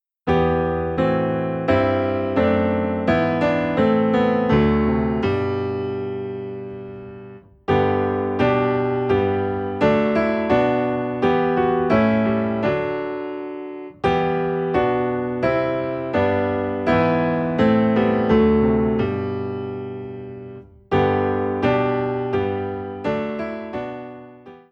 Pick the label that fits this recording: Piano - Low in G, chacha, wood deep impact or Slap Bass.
Piano - Low in G